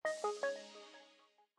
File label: Power Off; LG; Optimus-L5-E612